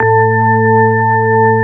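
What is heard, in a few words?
CX3 organ A2 L, Keyboards Old School; USB Soundscan vol.09 - Keyboards Old School; AKAI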